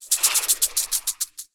Acid Barrage Rattle; Lurker; Evolve; Gorgon

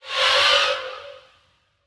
Banshee Missile Homing Loop End; Evolve; Elder Kraken

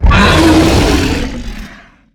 Harpooned; Evolve; Behemoth

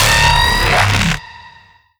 strider alert; Strider Remake

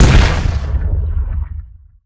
Seismic Blast Fist; Evolve; Behemoth

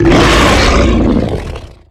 Stealth Attk Grapple; Evolve; Behemoth

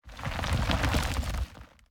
Leap Attack Impact Debris; Evolve; Meteor Goliath

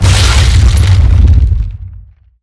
Mortar Cannon Impact; Evolve; Behemoth

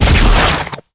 Tongue Grab Attack; Evolve; Behemoth

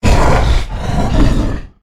Charge Attack Vox; Evolve; Meteor Goliath

